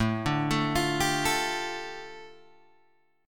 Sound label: A 7th Suspended 4th Sharp 5th, A, down, Guitar, Open G